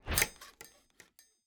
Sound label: block upgrade building